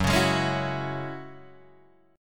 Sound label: F sharp 7th Suspended 2nd F sharp down Guitar Open D